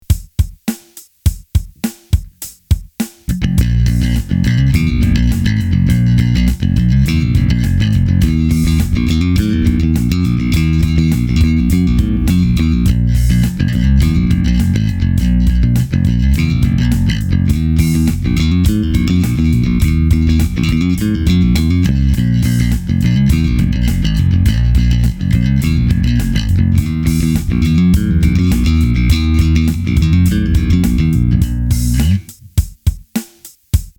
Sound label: bass with rock pattern